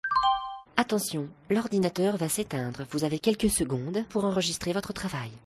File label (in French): Alerte